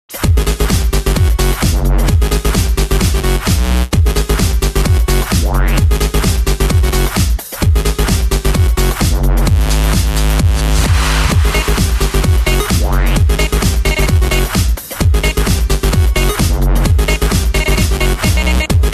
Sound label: electro house